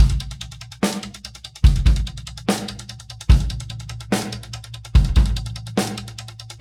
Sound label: drum loop 4 bar 4-4, 145